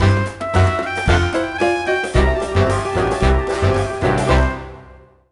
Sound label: Sting Level Player Fail